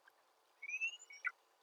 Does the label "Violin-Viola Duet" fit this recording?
No